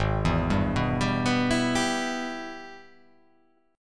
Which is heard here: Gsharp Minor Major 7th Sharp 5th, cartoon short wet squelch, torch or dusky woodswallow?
Gsharp Minor Major 7th Sharp 5th